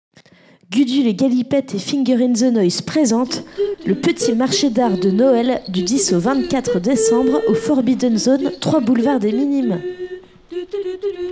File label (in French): jingle marche noel sans partenaire